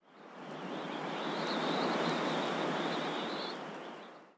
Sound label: snowy gust; ambient soundscapes; dota